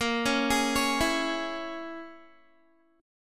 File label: Bb Minor 7th Flat 5th, Guitar; arpeggiated; 18,16,18,18,16,x; 4E,4A,5D,5G,5C,6F